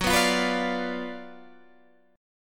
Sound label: Gb Major 7th Suspended 4th Sharp 5th, Guitar Open G